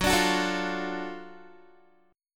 Gb Minor Major 7th Sharp 5th Gb down Guitar; strummed

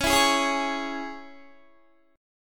Db Minor, Guitar; strummed; 21,19,18,18,20,20; 4E,4A,5D,5G,5C,6F

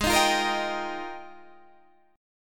F Minor 11th, guitar chord

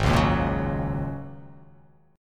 G sharp 7th Suspended 2nd Suspended 4th

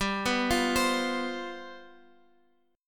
G 11th; arpeggiated

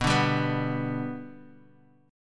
B Minor Sharp 5th B

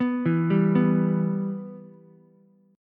B Suspended 4th Sharp 5th B Ukulele; Bsus4#5 arpeggiated; JGuitar

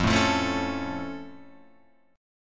F Augmented F Guitar Open F; strummed

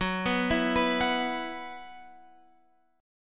F sharp 7th Suspended 4th, Guitar, Open F; arpeggiated; x,13,11,11,14,13; F#7sus4 Chord

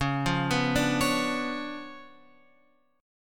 C sharp 7th Flat 9th, Guitar, Open F; arpeggiated; x,8,5,6,5,8

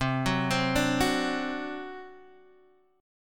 C Suspended 2nd Flat 5th C Guitar Standard; arpeggiated; 8,9,10,7,7,x; JGuitar

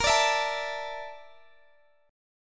D Suspended 2nd Bb; Dsus2/Bb

